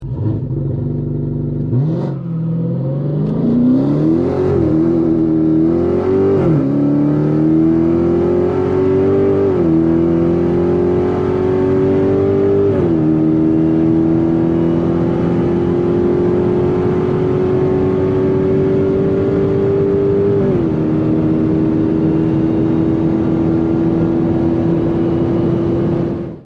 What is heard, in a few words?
dodge challenger srt hellcat acceleration; By Tuna